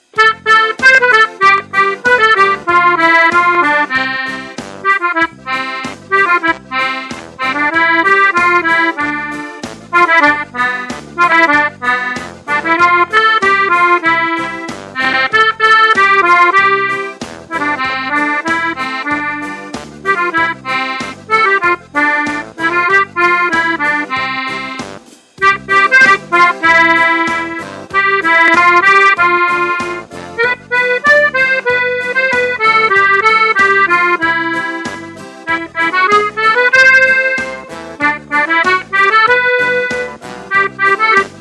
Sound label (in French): polka